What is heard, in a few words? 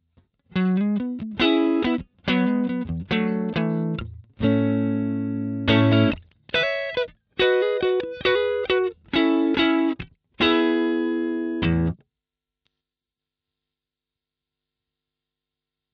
Blues; ATLAS Comp Dry Studio Optical Dual